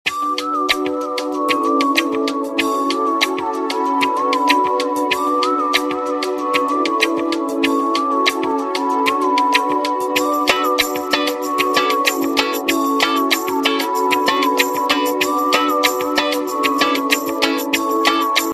sweet trance ringtone

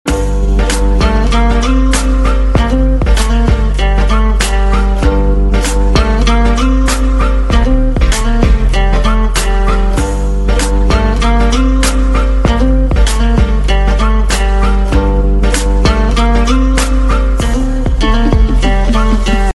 iphone 6 plus gold ringtone

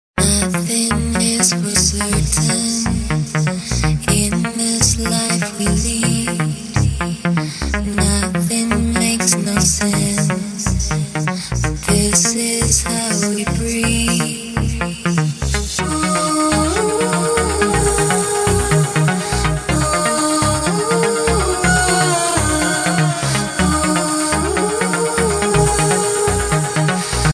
iphone ringtone

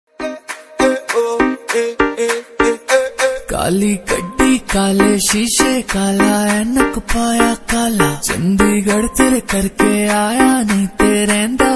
punjabi ringtone

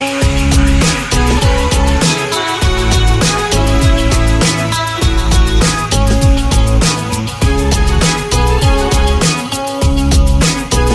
best bollywood instrumental ringtone

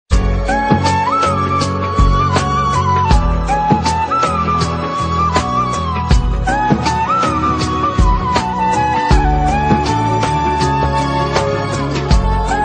sorry iphone sad flute ringtone

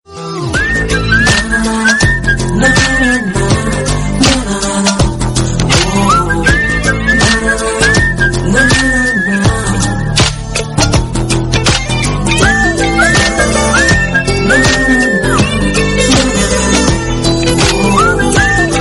Kannada whistle tone ringtone

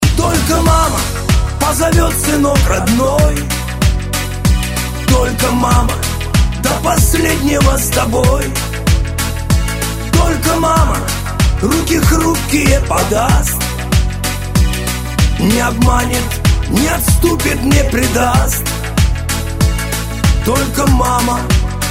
russian ringtone

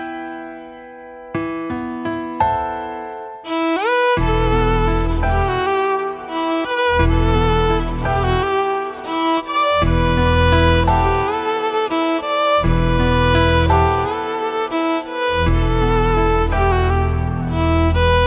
medley mashup cover

